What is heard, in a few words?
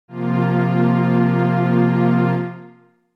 F Suspended4